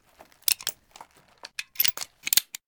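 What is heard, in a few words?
Weapon 44 revolver reload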